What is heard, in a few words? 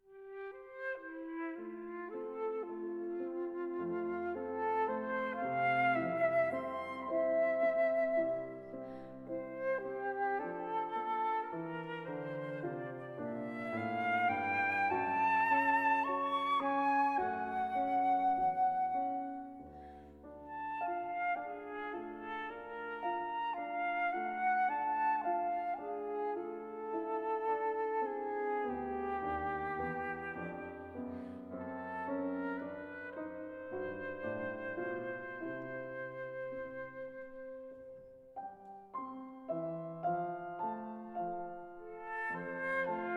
Flute Sonata in D; Sergei Prokofiev